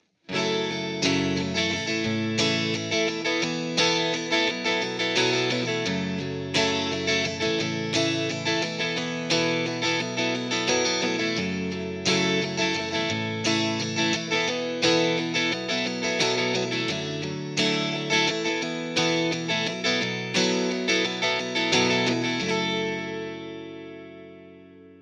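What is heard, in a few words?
Stratocaster JCM800 hot; mercuriall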